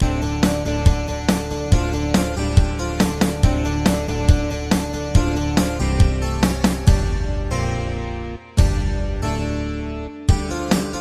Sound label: ringtone ring n roll; openmoko